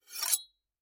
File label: rogue draw; A succubus is born